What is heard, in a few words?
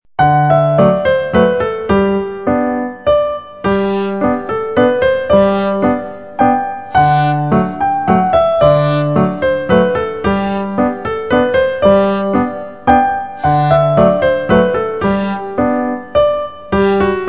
slow waltz